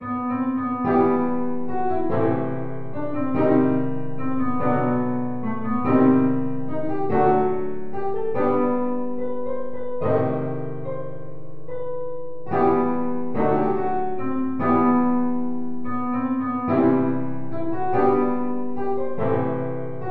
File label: French Jazz